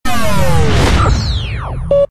atari sound

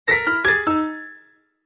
PIANO COMEDY

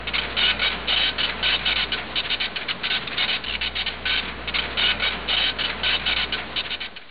computers printer

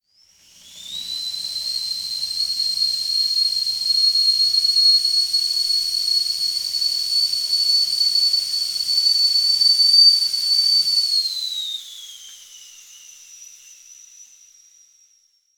Tea Kettle Whistle

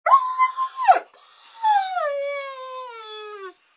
ANIMAL DOG MIXED

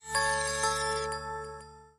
samsung galaxy s 7

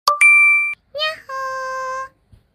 notification anime

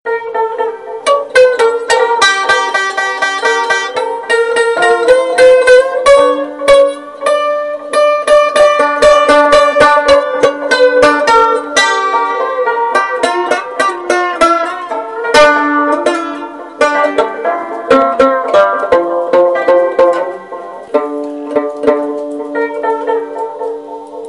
bandolin

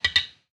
Button Click

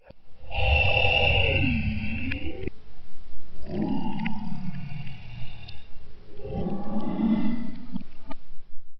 demon zombie ambiance

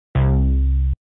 spawn; roblox

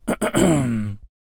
throat clearing

